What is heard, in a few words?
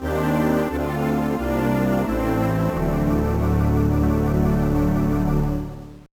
Appearance Jingle; Metroid Zero Mission